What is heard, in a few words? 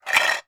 toy shape sorter plastic movement